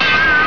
GLASS

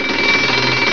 BELL FIRE; ALARMS

